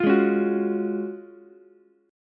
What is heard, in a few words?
Gb Major 7th Suspended 2nd, Ukulele; GbM7sus2 strummed; JGuitar